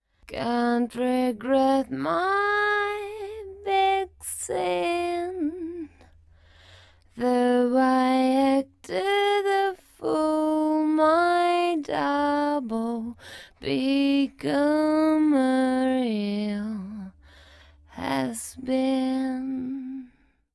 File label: solo vocal